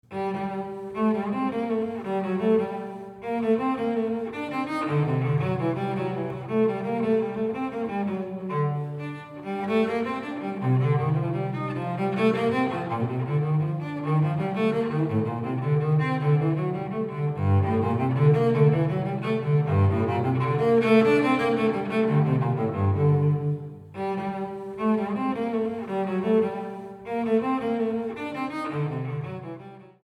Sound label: Corrente